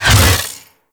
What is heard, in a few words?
Hammer Shield Whoosh D; Crp Hammer Shield